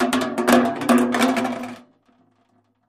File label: Aluminum Gutters Dro